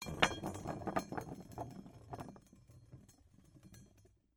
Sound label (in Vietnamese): qua ta sat lan tren san nha